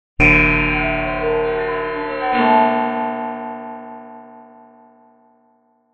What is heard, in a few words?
Gong